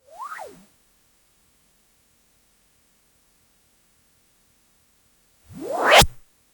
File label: a short clean and light; 44 1khz